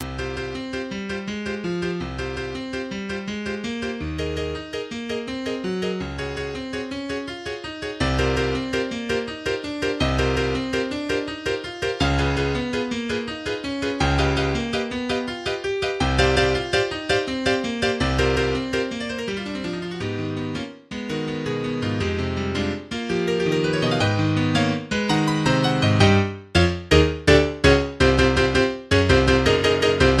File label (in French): exercise pour piano